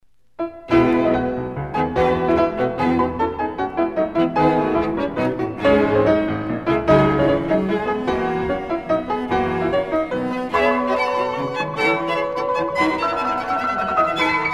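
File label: piano quartet; goetz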